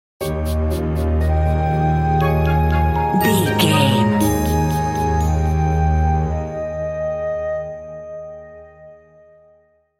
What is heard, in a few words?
land fairy tales stinger